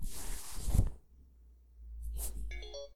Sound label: hand off; Apotheorasis